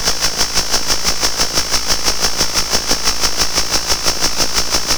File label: phase 2 spinning by loop; dogma